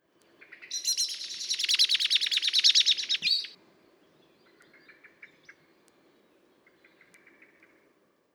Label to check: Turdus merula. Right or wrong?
Right